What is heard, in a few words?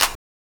clap single hit f sharp key; percussion; single hits one shots